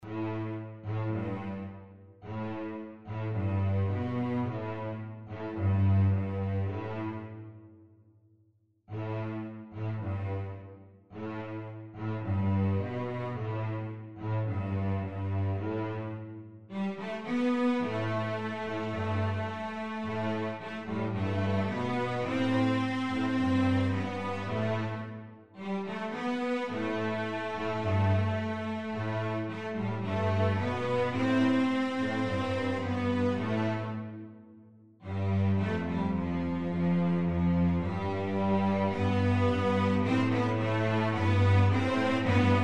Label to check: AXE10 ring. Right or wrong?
Wrong